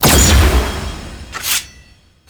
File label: Sword Finisher; Teshin